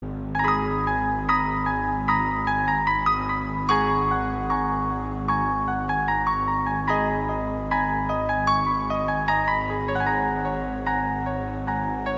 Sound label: full moon piano